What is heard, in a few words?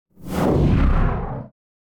Whoosh Short And Deep Sci-Fi; Hollywood Edge; sound designer's toolkit v2